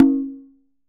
Congas Quinto open; Best Service; Festa Latina VOL-1; Single-Hits

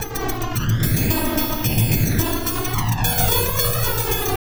tabla, 110 bpm; Zero-G; Pure Tabla

